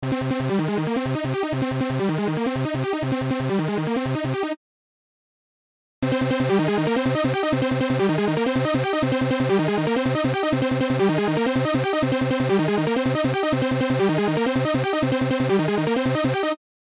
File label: Synth